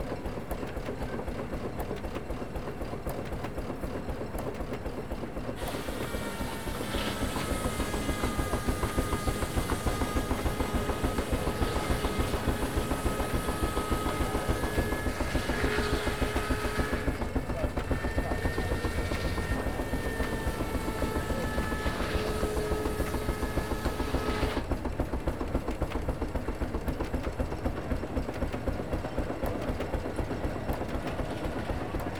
Traction Engines Sawing Logs; BBC Sound Effects Library; Age Of Steam

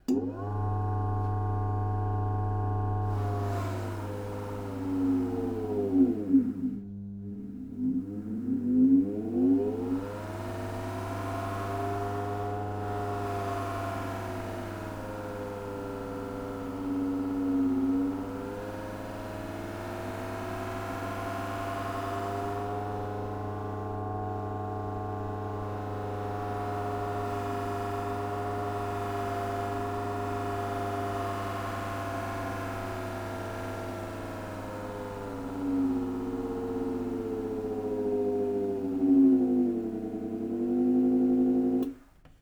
Shoe Cleaner Machine, On, Run, Steady, Off; 100kHz; Pole Position

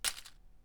Bullet Drop Leaves; Stuart Duffield; Bullet SFX

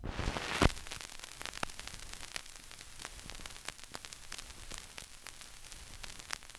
vinyl crackle; 99Sounds; Vinyl Noise SFX

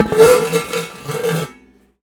yelling metal; Sound Design Tools; Raw Foley

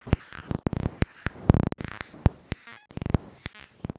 Bass drone - F, Circuitbend; Bass drone 4 - F (maybe); 99Sounds; Mangling Audio

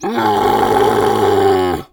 ANIMAL WILD Camel A, Grunt, Groan, Roar; Articulated Sounds; Camel Growl Vocalizations

